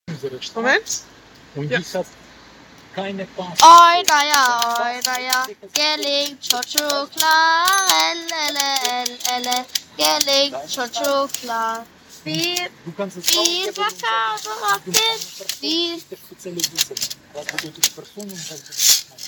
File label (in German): Schreibmaschinenmusik